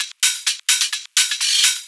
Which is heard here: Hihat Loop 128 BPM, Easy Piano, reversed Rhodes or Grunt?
Hihat Loop 128 BPM